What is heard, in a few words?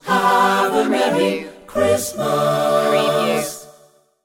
very merry christmas loop